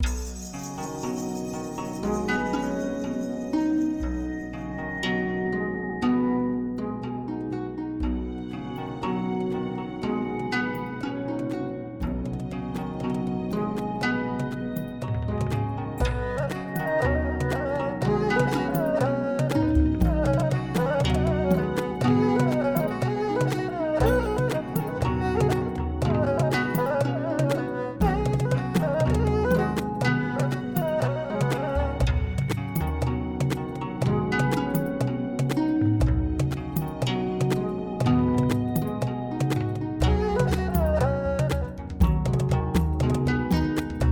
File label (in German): Music Arabisch